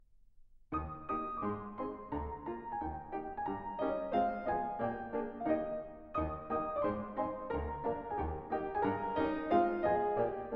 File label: Version for Four Pianos